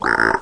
fun frog; Weird and Interesting Hits; single hits

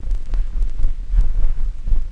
dirty record lead in; Scratching and Turntablism; DRUM LOOPS and BREAKS

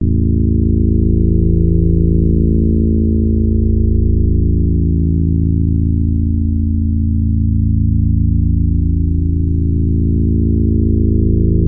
DRONE; Studies in MASS

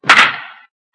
wood bat; Sound FX Real Life

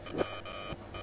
115 EMF radiation background

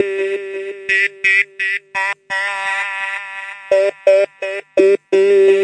170 vocalsynth echo, synth and electronic loops

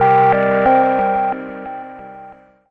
crunchy pretty synth

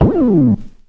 analog spindown

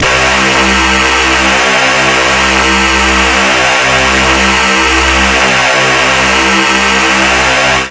power tools, Synth

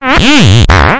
GRITTY; Studies in MASS

